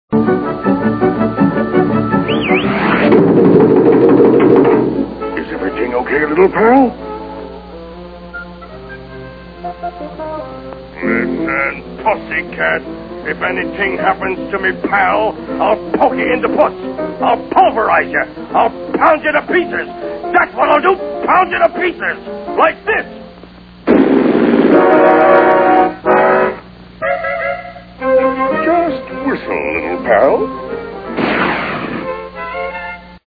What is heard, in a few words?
whistle